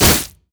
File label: thorn plant; Zyra; LoL